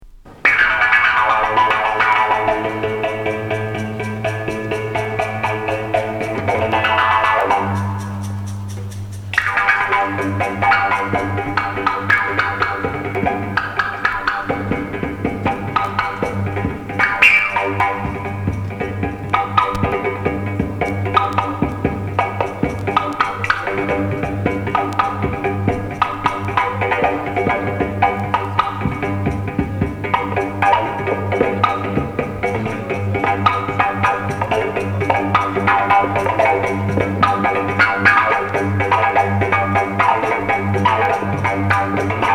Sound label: oriental wind